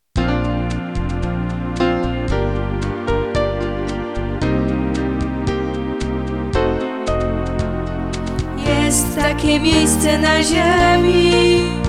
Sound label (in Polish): nowy hymn